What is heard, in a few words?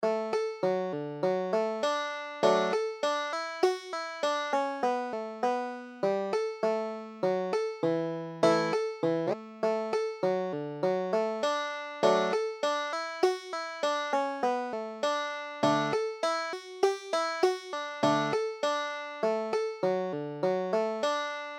banjo